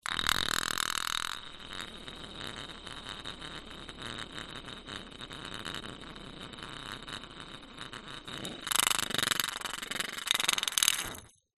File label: leisure toy spinning top plastic on wood